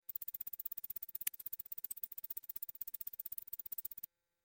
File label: Electrical Clicking; electrical interference